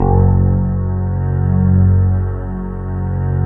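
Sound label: synth Morpheus; Sound & Vision - Gigapack II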